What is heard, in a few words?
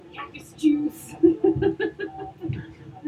Cactus Juice With Laughter